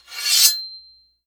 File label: Sword